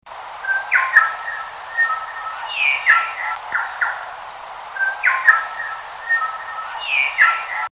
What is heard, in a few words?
tui or bellbird